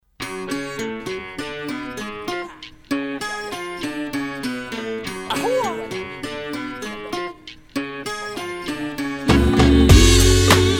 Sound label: Cha Cha Cha